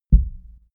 heart beat single low

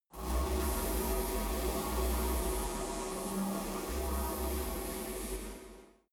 space buzzing energy plant; energie

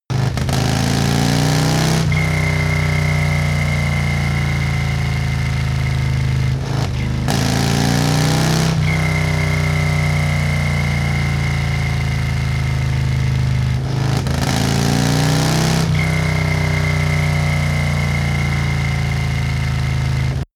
motorcycle harley accelerate triple whistle; motorraeder; verkehr transport

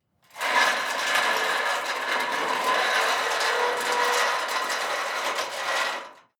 metal big light scrape long

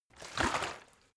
bottle water sparse upend; berufe

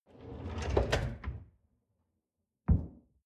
folding door close short

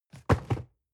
cardboard box medium fall low soft; objekte

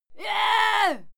female fight scream

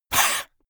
monster hiss flat; koerpergeraeusche; comedy-cartoon; soundpool

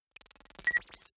confirm micro click; maschinen; signale; soundpool

